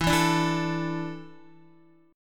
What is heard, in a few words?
E 7th Suspended 4th, Guitar; strummed